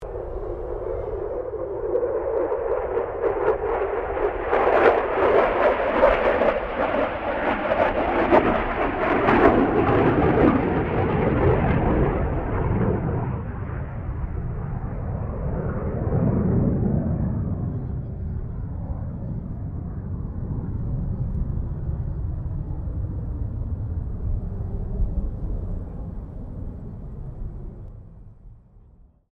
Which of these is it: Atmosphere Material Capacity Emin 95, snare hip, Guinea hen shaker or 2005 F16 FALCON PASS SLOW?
2005 F16 FALCON PASS SLOW